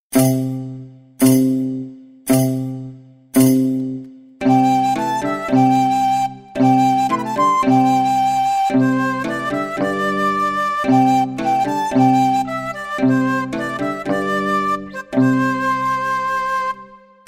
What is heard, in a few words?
instrumental; drei geschichten aus china